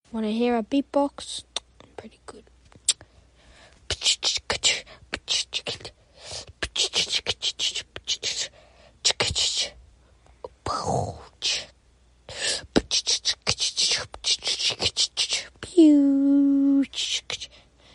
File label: beatbox